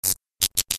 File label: dying light bulb